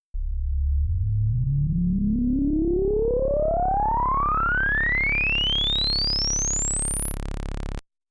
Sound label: Ladder Reso; synthmaster